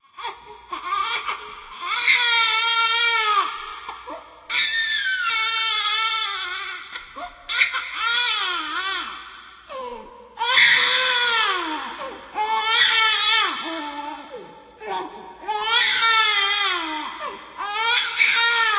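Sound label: Ambient Baby Crying Loop; silent hill homecoming 2008 gamerip pc ps3 x360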